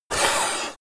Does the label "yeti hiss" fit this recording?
Yes